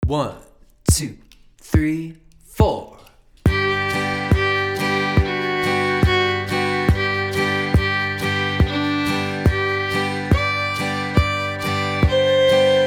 fiddle; Groundhog Teaser; old time appalachian fiddle lessons

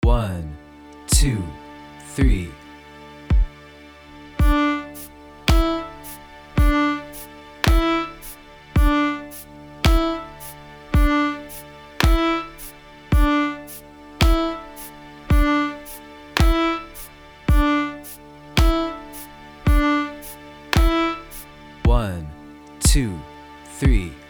staccato, fiddle

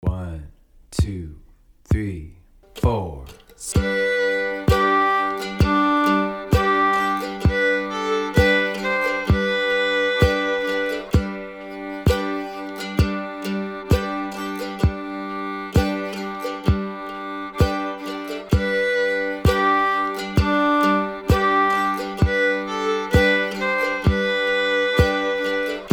practice loops fiddle and audiation

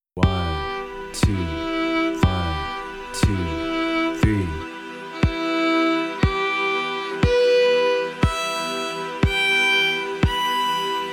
minor triads E Minor Single Bow, fiddle; scale central

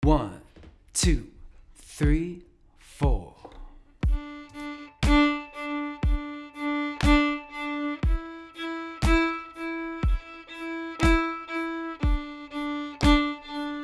offbeat accents train d0 1, fiddle; offbeat accent patterns

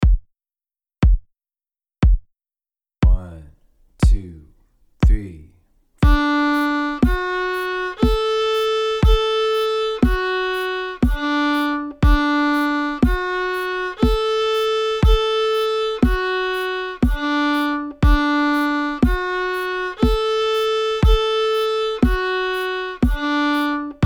triads, fiddle; scale central

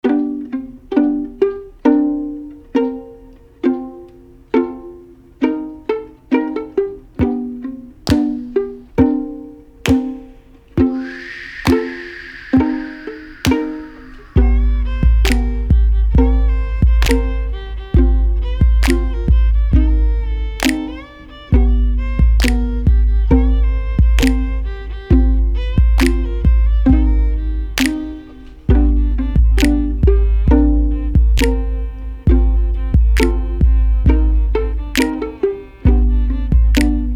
g minor low fiddle mix

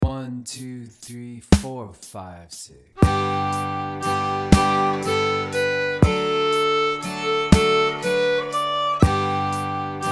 jig play along, fiddle; kesh jig

